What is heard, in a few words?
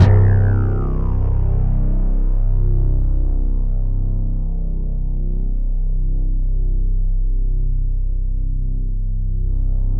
C1, Synth Line; 90 s Sample CDs; NiceBeats; SynthLine VOL-27